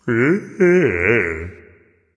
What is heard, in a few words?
content, voice; Smite; ymir skin12